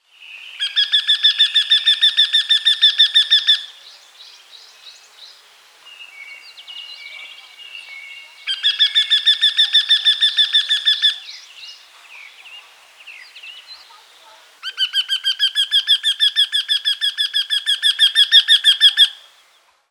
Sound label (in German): wendehals